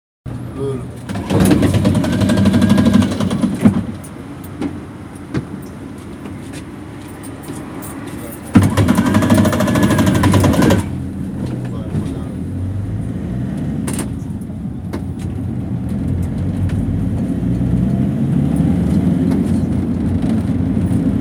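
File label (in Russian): звук маршрутки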